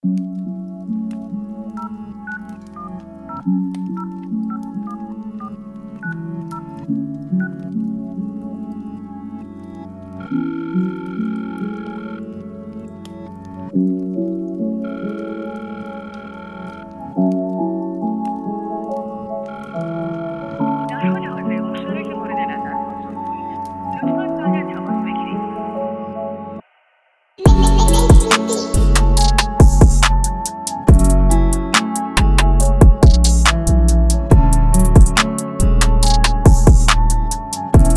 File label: Call beat